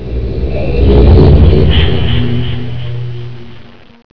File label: Transport